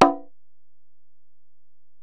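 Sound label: Acoustic Percussion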